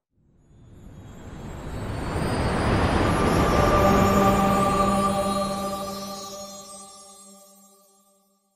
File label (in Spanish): efecto sonido resplandor magico; sonidos magicos